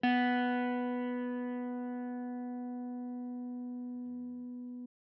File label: mid pluck